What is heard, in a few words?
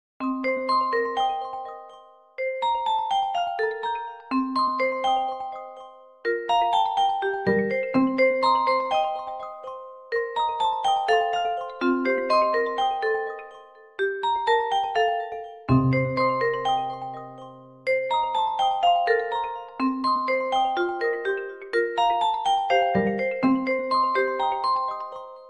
Brook